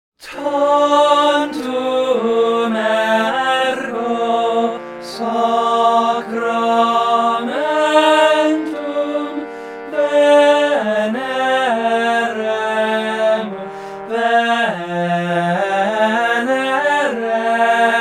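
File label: with instrument; CHABANEL PSALM TOME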